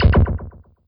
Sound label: Hit; tempest 4000